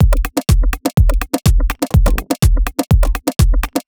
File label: 124bpm, House Drumloops; 90 s Sample CDs; Zero-G House Fabrik Drumloops; ZG Drumloops